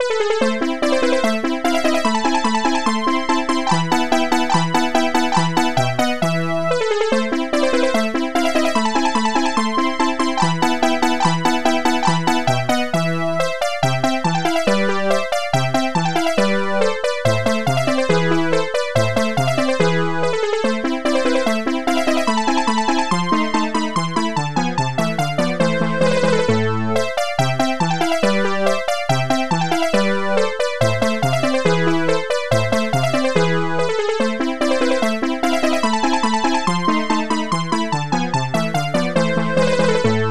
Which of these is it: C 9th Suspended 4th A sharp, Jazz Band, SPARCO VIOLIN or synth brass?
synth brass